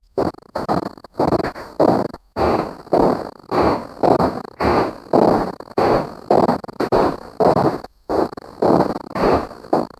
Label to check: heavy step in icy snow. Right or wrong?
Right